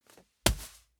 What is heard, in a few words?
sand bag drop